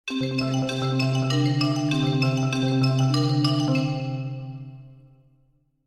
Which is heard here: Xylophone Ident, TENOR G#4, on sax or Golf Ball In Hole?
Xylophone Ident